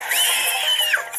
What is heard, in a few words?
pinch raccoon scream